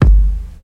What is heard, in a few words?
boomy bass drum single hit g key, steel kick drum